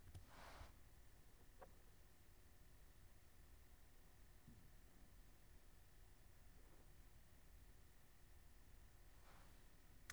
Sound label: Background Noise; insurrectionist